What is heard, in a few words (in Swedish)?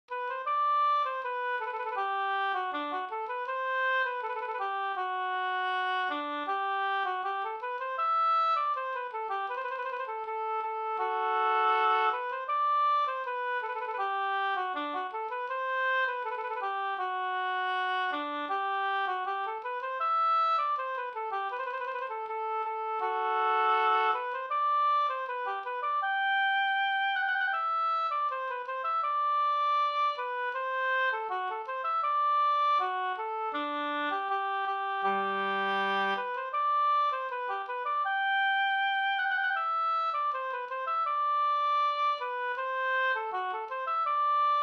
brudmarsch; fr Dala-Husby